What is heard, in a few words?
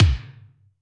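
Kick Plated, Alesis D4; Kik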